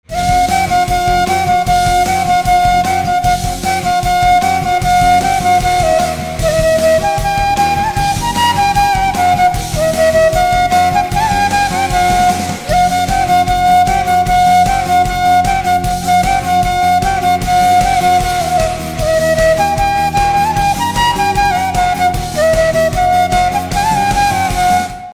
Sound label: Flute Instrumental Ringtone, devotional